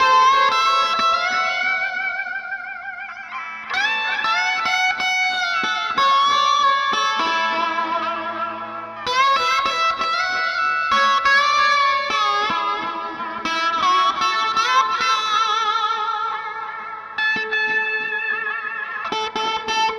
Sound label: Mel Delay Wah; Sonic Foundry; Paul Black